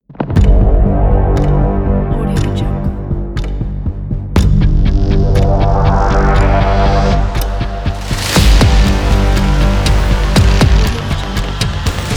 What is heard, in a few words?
cinematic tension dark trailer ident